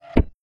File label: Switch Stuck; Structures; openclonk